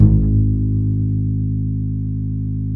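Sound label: double bass G#1; Univers Sons; Jazzistic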